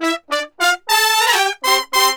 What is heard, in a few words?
BRASS SALSA; Best Service ProSamples vol.14; AKAI